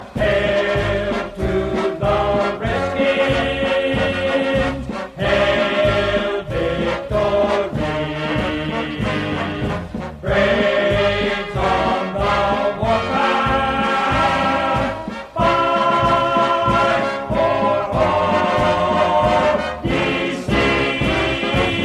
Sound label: fight song musical tone ringtone